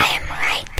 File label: scary girl